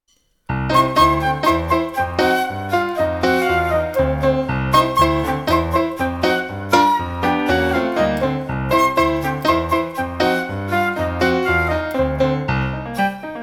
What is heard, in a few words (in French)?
jazzinerie avec partie flute; fouillard rard